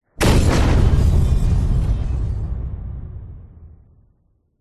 explosion boom large with breaking glass more emphasis on the boom